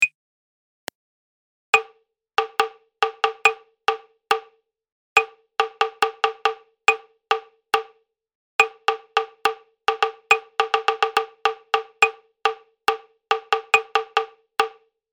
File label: Prepared Rhythm; Practice Sheet 3; advanced musicianship